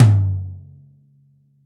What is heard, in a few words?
long room reverb tom drum one shot g key